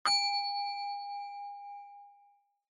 Onoma Ding Low Mid

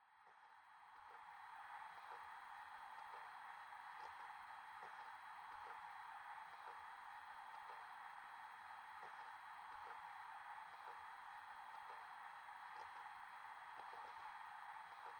PC Operating Noise Long

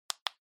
Cell Phone Hardware Button

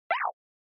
Motion Slide Fast Low

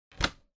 Printer Scan Lid Close

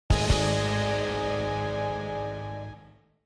Parody Accent Long

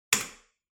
Light Switch Wide Panel, Hall Hard

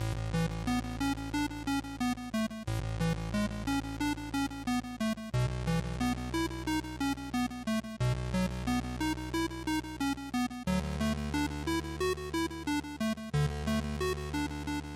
NES RPG Ending Loop90

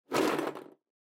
Drawing Box Hand Out Strong

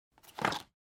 Calendar Flip

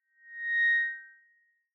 Megaphone Feedback Dry

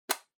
Tablet PC Earphones Unplug

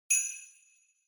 Sleigh Bells Dry Knock Gentle

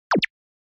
Motion Pop

